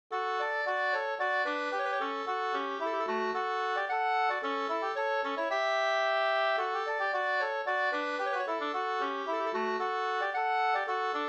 polska

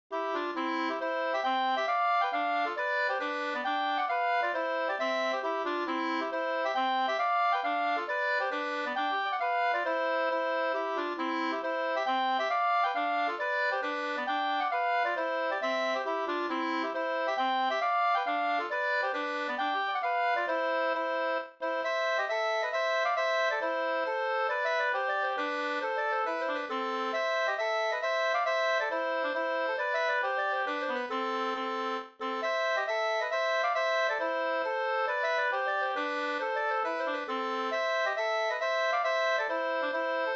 hambopolska

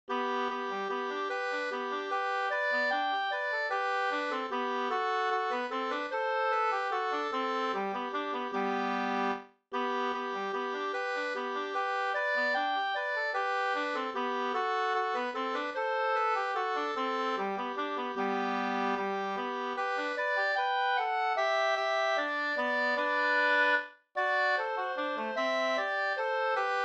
vals; Osteraker

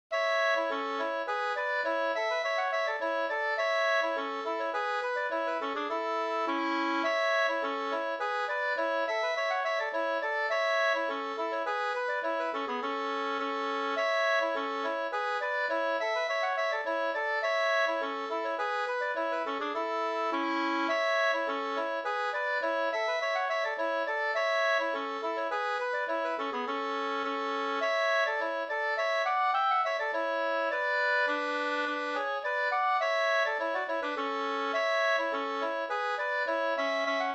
slangpolska

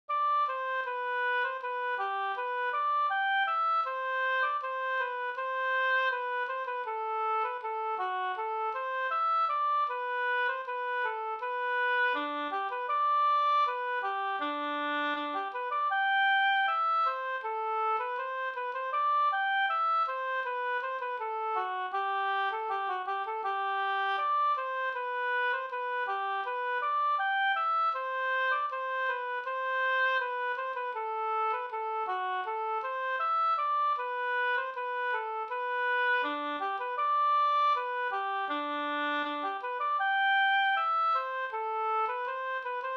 brudmarsch Burtrasks brudmarsch

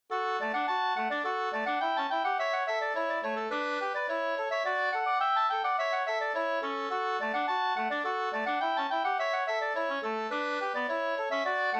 slangpolska

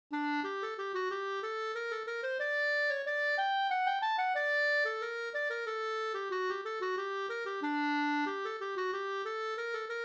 polska senpolska; Havero Mp; Anders Hallstrom

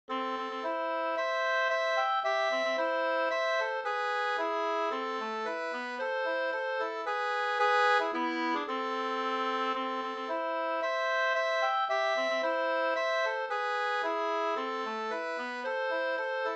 polska slängdans; Rattvik Da

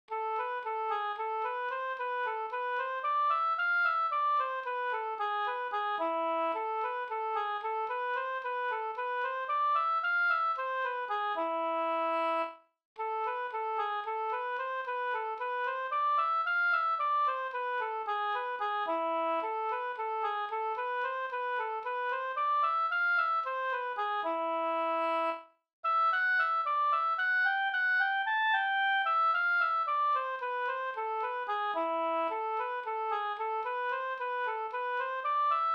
skanklat

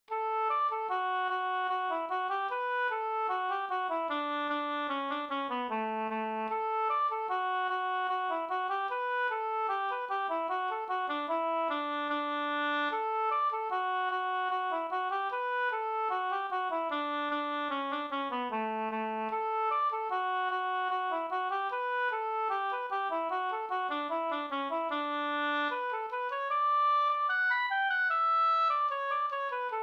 schottis; Ronningeschottis av Bjorn Siwertz

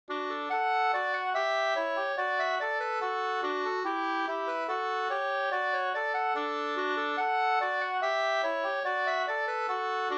engelska, 2st; fr Nynashamn

